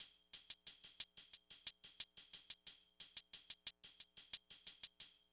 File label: Hat Tamb, Percussion; Beat Components